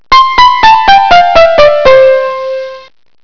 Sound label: Piano2